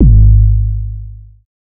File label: 808 one shot Ab; Slate Digital BASSHOLE Sample Pack